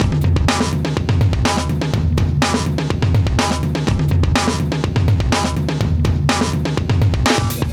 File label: 124 live breaks